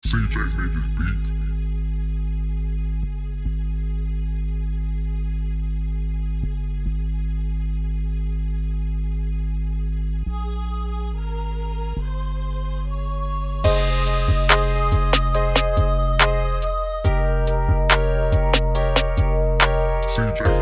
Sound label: 808 Beat